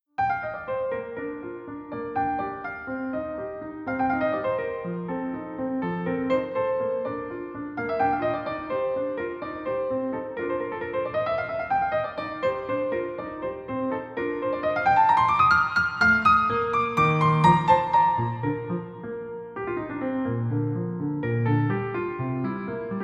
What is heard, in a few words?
Piano Sonata No. 13 in B-Flat Major I. Allegro, Piano Classique